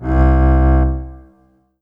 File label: Yamaha TG500 ST Cntra C2